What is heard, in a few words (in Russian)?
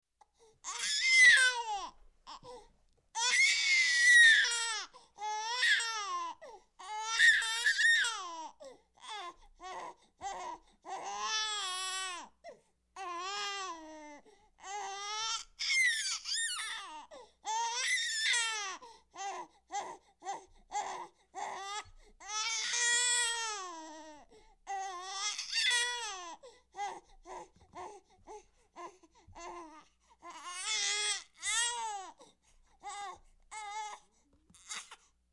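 infant crying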